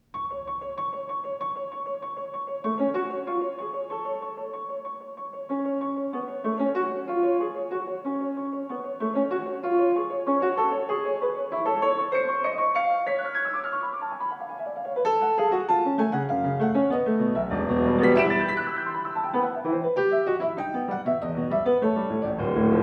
Sonatines for Piano, Sonatina in F-Sharp Minor Allegro moderato; Sonatina No.1; Sibelius; Op. 67